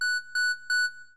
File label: focus Mode; NVDA; Random NVDA Sound Scheme